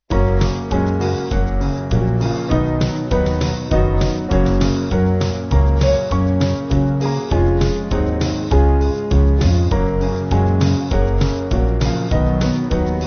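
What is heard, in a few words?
Band, Music Backing; Tis So Sweet; Kirkpatrick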